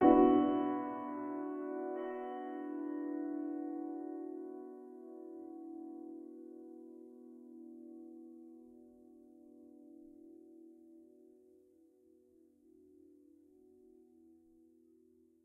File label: Piano